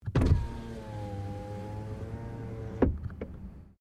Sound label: Car Toyota Corolla window open; 2009 Toyota Corolla hatchback